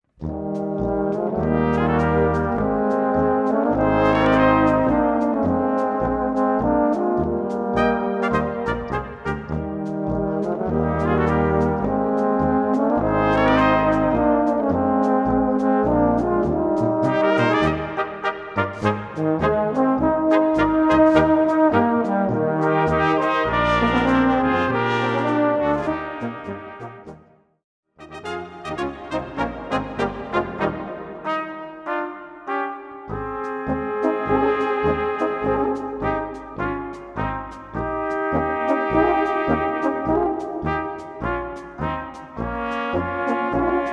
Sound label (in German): Polka